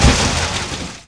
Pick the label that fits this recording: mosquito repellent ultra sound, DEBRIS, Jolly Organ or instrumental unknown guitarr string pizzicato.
DEBRIS